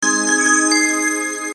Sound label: choice symbol; Lucky Zodiac